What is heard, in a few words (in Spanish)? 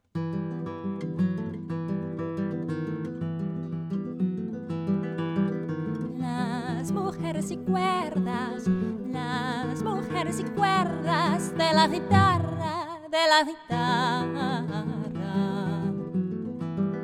cuerdas y vocal; las mujeres y cuerdas